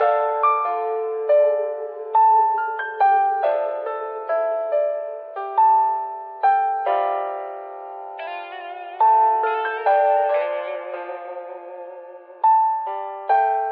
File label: piano sudden guitar, sad; Loops